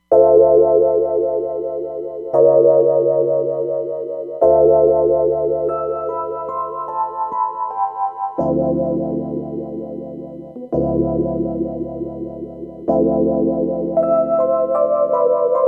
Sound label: Psycho EP